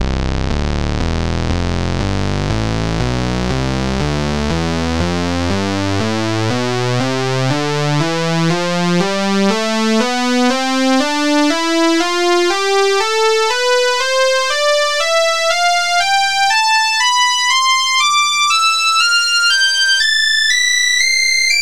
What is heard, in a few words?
saw wave; tonleiter